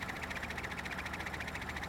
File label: runloop; xlr8; Ben 10 - Hero Time Game